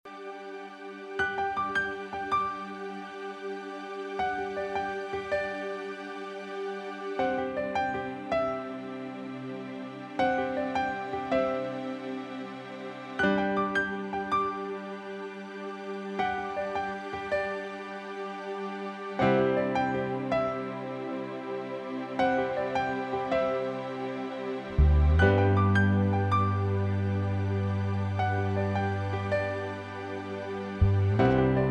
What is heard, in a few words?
Key Of G major, backing tracks